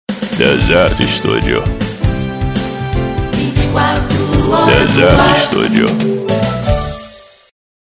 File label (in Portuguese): Vinheta Cantada 24 Horas No Ar; Desert Studio